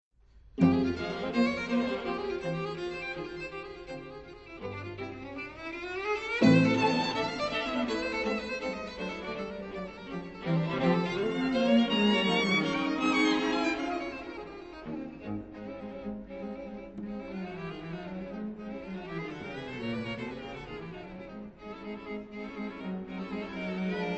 Quatuor Fa; Quatuor en fa majeur, quatuor à cordes, composition : 1943 ?; 3. Posément. Très modéré

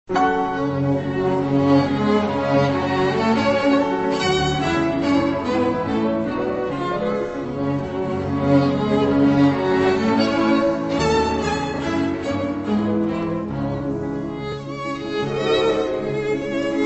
Quintette; Quintette en fa dièse mineur, piano et quatuor à cordes; 1. Molto agitato e con fuoco; Paris : Heugel, 1923

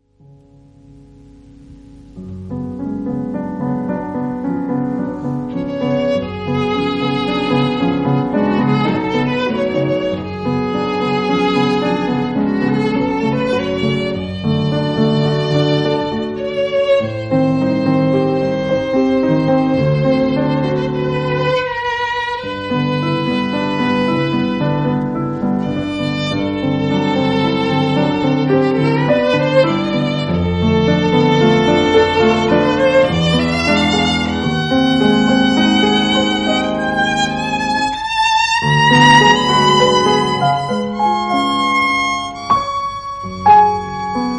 Sonate violon piano; Sonate, violon et piano; 3. Modéré, très à l'aise, au gré de l'interprète

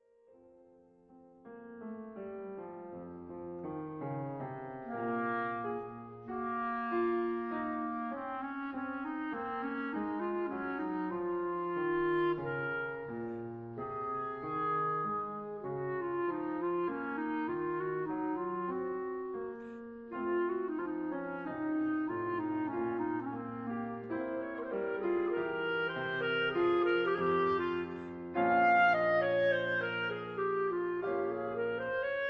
Clarinette Sarabande

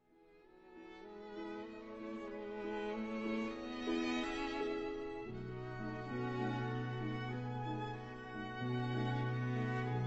Quintette

